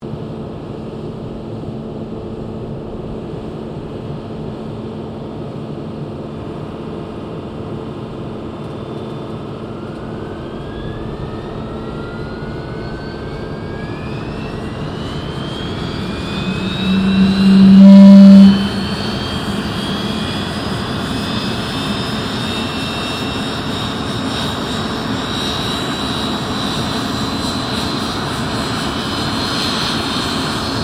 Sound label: AIRCRAFT JET PROVOST T2 STARTUP GENERATOR; BAC Jet Provost; Airplanes; Vehicles